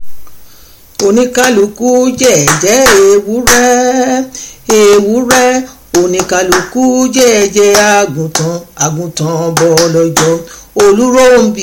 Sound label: Orin Olurohunbi song